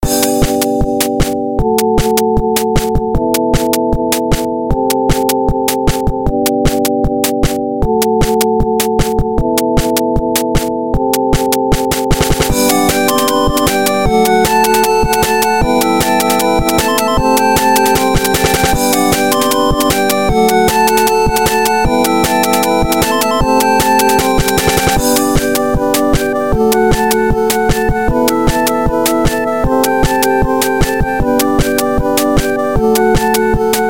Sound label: 8-bit loop; Duet